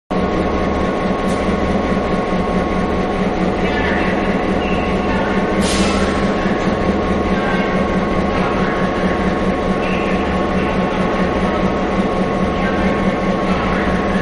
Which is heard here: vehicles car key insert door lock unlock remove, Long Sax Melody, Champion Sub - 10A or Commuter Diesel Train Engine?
Commuter Diesel Train Engine